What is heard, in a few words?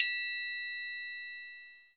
TRIANGLE; Greytsounds VOL-1; ORCH PERC 4